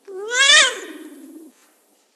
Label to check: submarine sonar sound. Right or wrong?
Wrong